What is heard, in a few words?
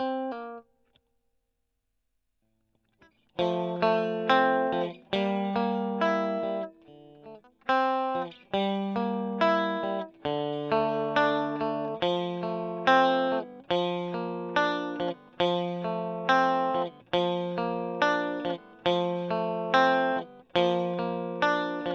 E. GTR Gefell